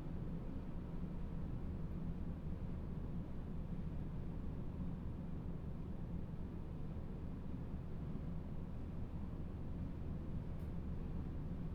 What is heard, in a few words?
cash all room tone; blindside